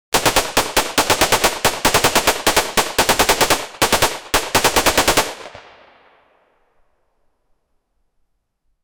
loud realistic firearm, shotgun

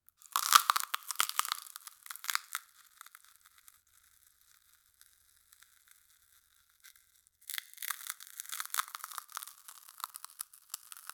chocolate crunch

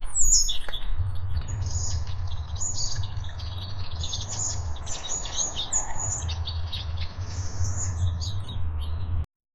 scary humming sound bird, horror ambience

